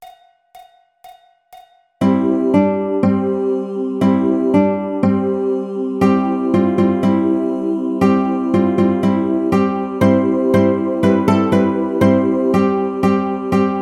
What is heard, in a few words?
Three Blind Mice Ukulele; Key of C (G for Baritone); Lesson #7: Three Blind Mice; Ukulele Solo